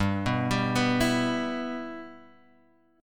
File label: G 6th, Guitar, Standard; G6 arpeggiated